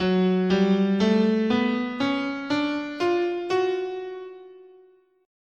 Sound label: Gb Neapolitan Minor, scale